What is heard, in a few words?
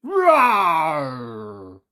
dino roar; SyrinScape; Syrinscape unplugged; Jungleplanet dinolaser battle